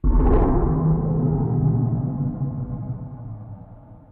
roar; cstrike; war3source; dragonborn

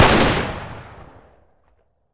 m3s90 fire, shotgun; weapons; garrysmod; m3s90p

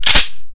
m3s90 pump forward, shotgun; weapons; garrysmod

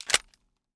glock20 mag in; garrysmod

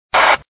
radio close; garrysmod

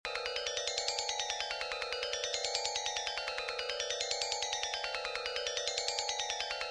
sleep; cstrike; war3source; bane

